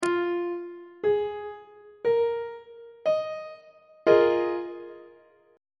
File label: b flat dominant 7th suspended 4th 2nd inversion on treble clef